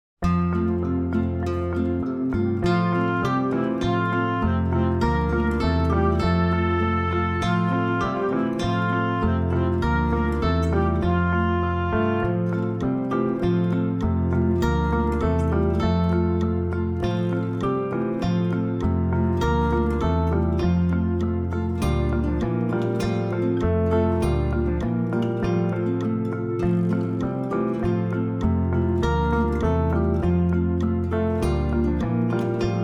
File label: backing; este dedito